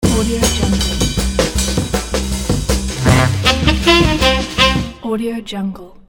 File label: drums and horns ident